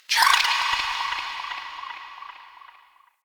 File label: Hallow Spirit Vocal Death; Halloween